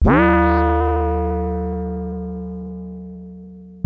SYNTH FM; Populous

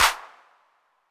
Clap 808 Saturated B; Hand Clap; Multi Saturated

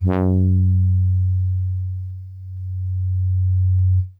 Soft Horns DX100 Fs2

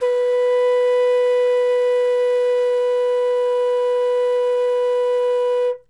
Wodden Flute B2

